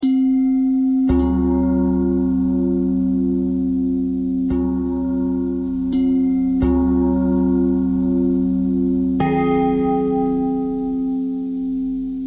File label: bell thread pentatonic